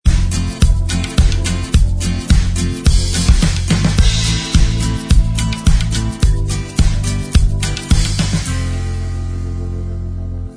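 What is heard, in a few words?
instrumental, backing tracks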